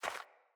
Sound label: Rat Guide Step, Foley